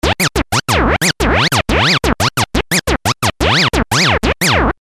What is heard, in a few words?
scratch, polivoks